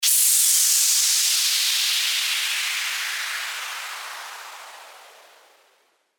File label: WHOOSH